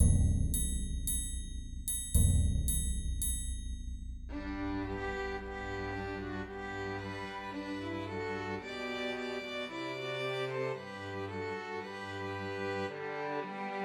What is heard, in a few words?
Christmas Trios for Two Violins and Cello; Hark The Herald Angels Sing